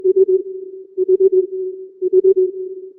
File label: calling